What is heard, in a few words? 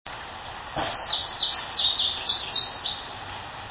bunting indigo; birdsongs